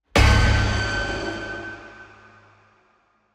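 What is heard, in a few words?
designed stinger pig scream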